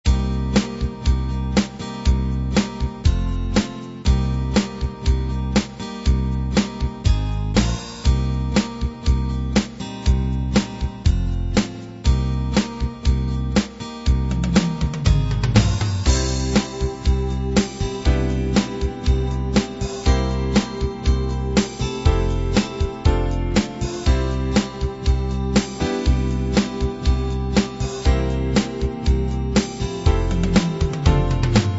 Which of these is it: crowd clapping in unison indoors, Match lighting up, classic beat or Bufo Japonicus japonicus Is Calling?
classic beat